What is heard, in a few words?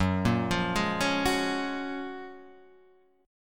Gb Major 9th, down, Guitar, Standard; arpeggiated; JGuitar